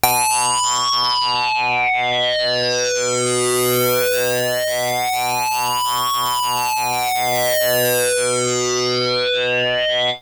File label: synth